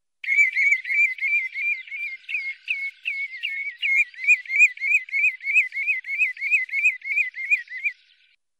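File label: travnik golos, common redshank voice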